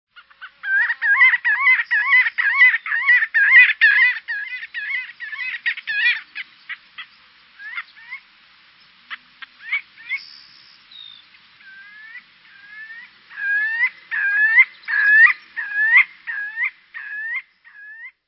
Marbled Godwit; Birds

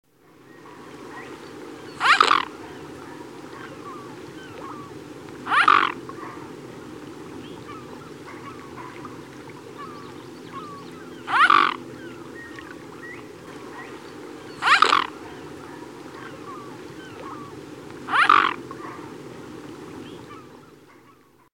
Mute Swan; Birds

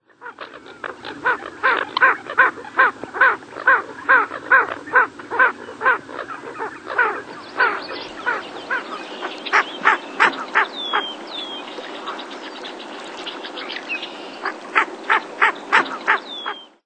Ring-necked Duck; Birds

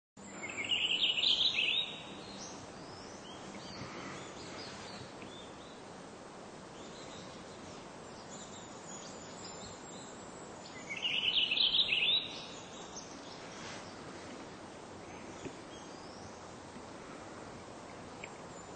Purple Finch; Birds

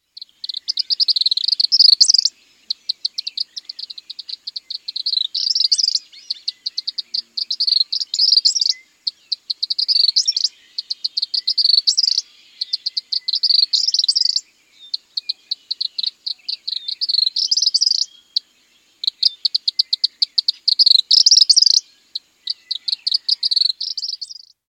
Tropical Kingbird; Birds

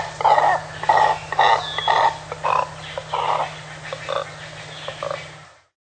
Double-crested Cormorant; Birds

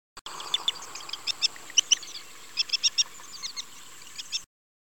Muscovy Duck; Birds

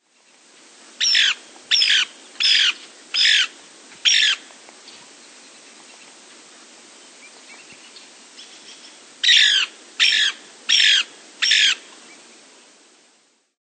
Lewis's Woodpecker; Birds

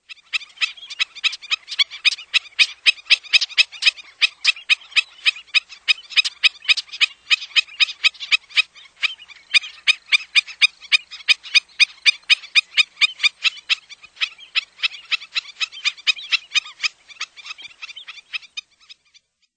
Black-necked Stilt; Birds

